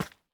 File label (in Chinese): 蜡烛踩踏声